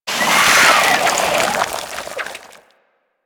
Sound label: Infested Face Hugger Latch Vocals; Warframe